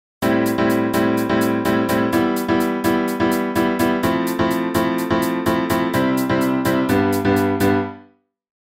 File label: Piano normal reverb